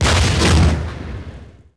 explo laser hi; impacts explos

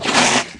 Large Spear Tail, Aliens

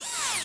droid servo

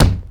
hit, Material; XBOX; Freestyle Street Soccer; In Game

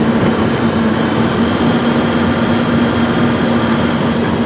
tram engine loop; auto; Tony Hawk's Project 8; XBOX

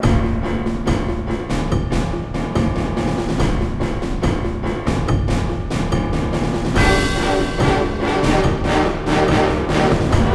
epic intense; Xiaolin Showdown; XBOX

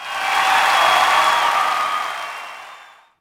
Cheer, Crowd, reactions

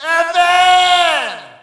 Shout, audience; UFC Tapout 2

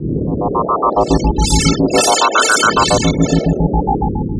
shield Gen rebuild; Star Wars Clone Wars; XBOX; Xbox Live Effects

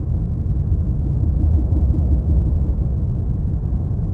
deep Gen Hum; machines mechanical

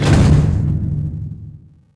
pallet hit metal; objects; Star Wars Obi-Wan; XBOX

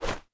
BUZ FLP

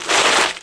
bmx skid; terrains; Tony Hawk's Project 8; XBOX

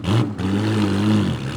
car rev; Matt Hoffman's Pro BMX 2; XBOX

